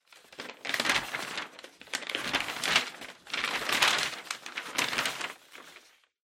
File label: Newspaper Flip